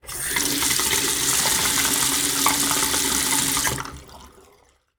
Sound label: Sink Fill; Liquid; 1001 Sound Effects